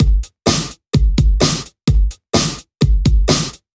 House Beat, 128 BPM

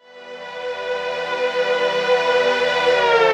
Trance Sweeps; Vengeance Samples; VEC2 FX

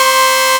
Synths C; Vengeance Samples

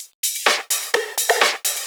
Electro Loop 128 BPM; Vengeance Samples

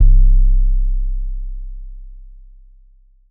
808 Boom, trap

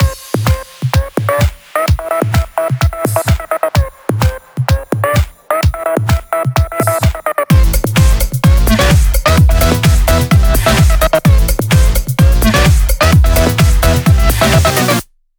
128BPM Mix; Vengeance Samples; Fatman Kit

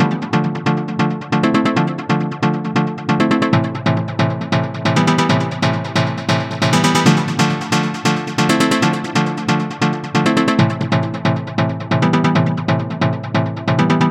Special Sounds Root D 136 BPM; vengeance essential club sounds-3

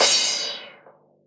Cymbals crash; Vengeance Samples

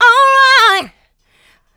voc, female vox, Vocal House

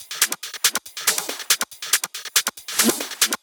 Loop 140 BPM; Vengeance Samples

